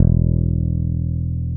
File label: BAS; Best Service Gigapack 2